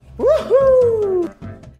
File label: Woohoo